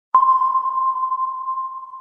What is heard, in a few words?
Sonar Ping